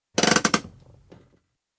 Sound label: Ambulance hand brake applied; Emergency Vehicle; BBC